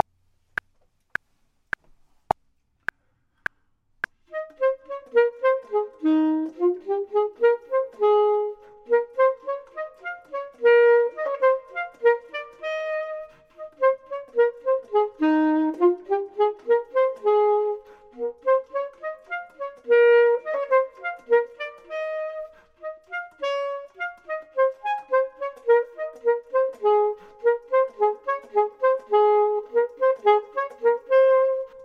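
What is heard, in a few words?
top, Saxophone and Clarinet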